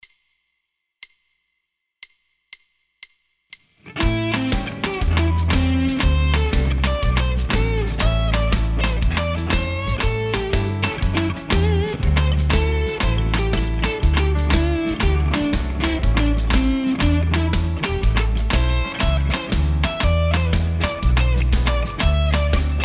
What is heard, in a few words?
blues 4 times on each original clave with drum hits; 12 claves